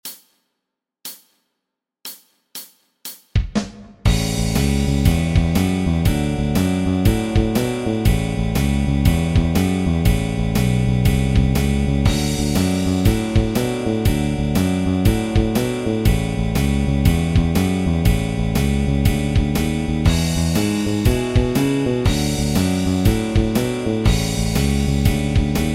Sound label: Blues Practice Bass; bass guitar tab; musescore